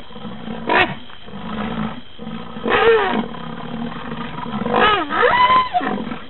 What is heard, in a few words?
rhinos